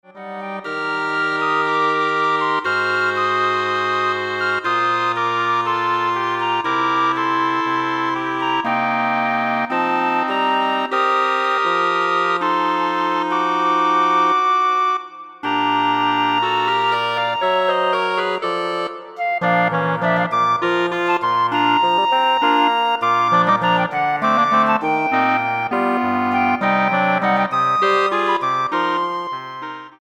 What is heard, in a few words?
Woodwind Ensemble